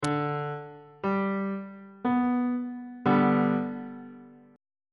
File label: a double flat major 2nd inversion on bass clef